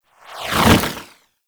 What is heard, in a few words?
Dragon Pelt Idle Wing Flap